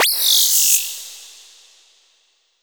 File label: Laser End